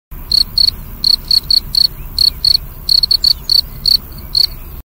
Crickets in summer

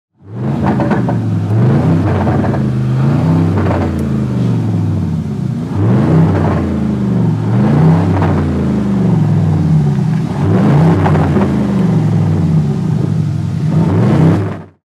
Changan, اگزوز

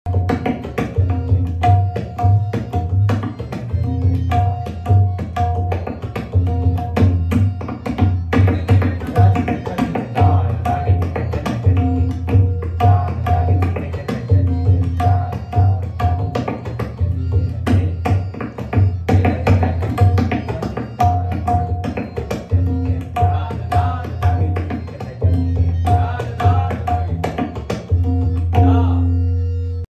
Tabla; طبله

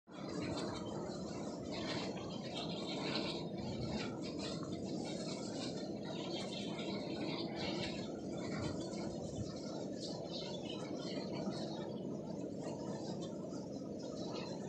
windmill; آسیاب بادی

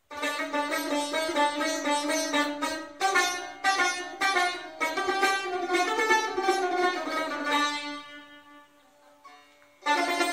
tar instrument

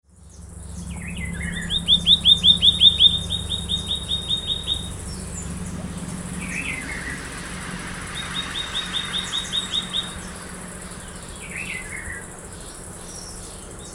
Scrub greenlet

